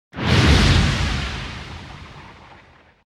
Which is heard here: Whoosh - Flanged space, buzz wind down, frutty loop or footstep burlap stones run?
Whoosh - Flanged space